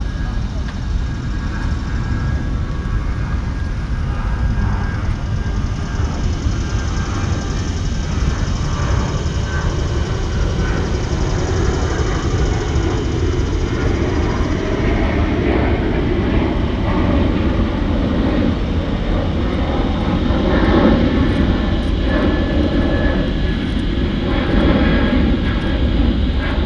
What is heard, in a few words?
binaural, usa orlando hotel entrance